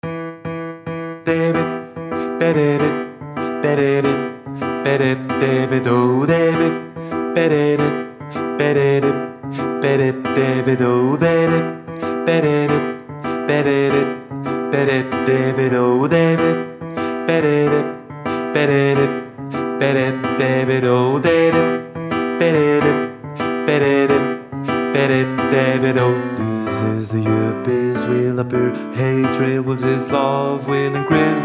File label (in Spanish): BAJO, SHOW CHOIR; CAROL OF THE BELLS; ADIOSES SHOW CHOIR; Navidad 24